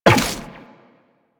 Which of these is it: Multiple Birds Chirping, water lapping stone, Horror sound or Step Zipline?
Step Zipline